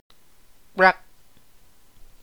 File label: become restless make rapid jittery movements fire crackl; fire crackling; ibibio